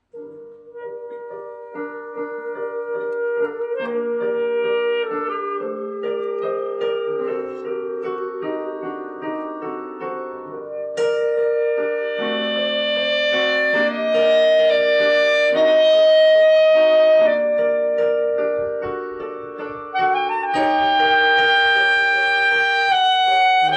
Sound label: Melody